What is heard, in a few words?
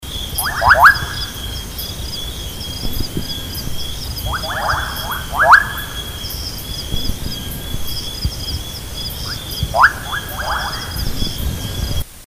kassina senegalensis, animal songs